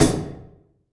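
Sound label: Cricket Oneshot, beats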